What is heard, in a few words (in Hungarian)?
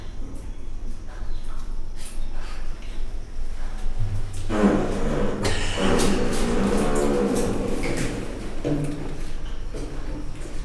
szellent nevetésfoszlány, csimpánz; gyori zoo 2014